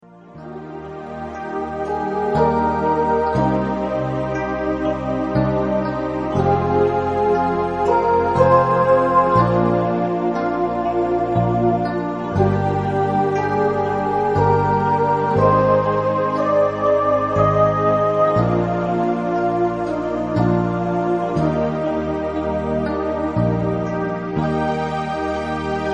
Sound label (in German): Wolkenmeer Entspannungsmusik